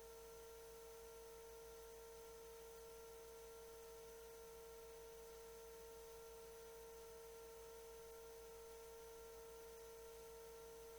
Dead Air Static Hum

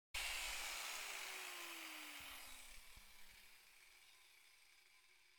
Shut Down

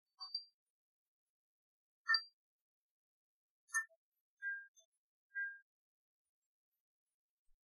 Neon Lights Flickering On

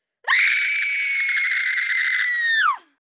Longer Scream No Effects

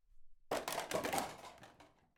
Throwing Stuff

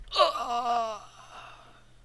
Death Agony

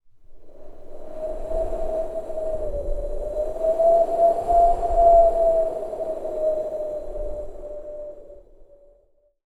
Wind Voice

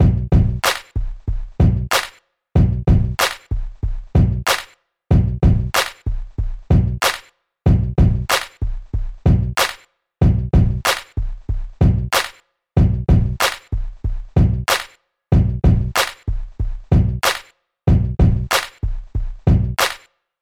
Hip Hop Drum Loop

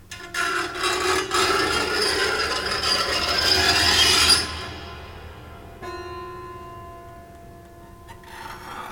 Scrapes

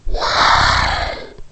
Dragon Roar Breathy

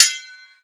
sword

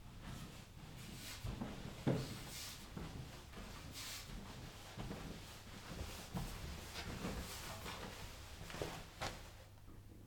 Walking Up Stairs

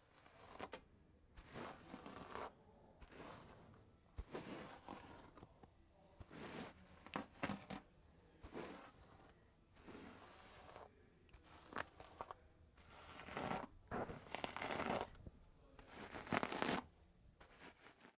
Combing Hair

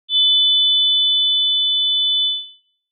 Trench Whistle

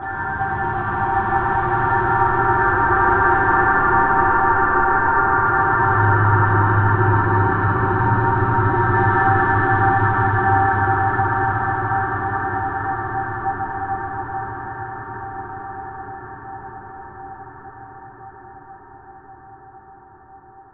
Dronescape

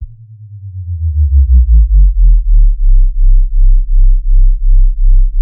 Drop That Sub

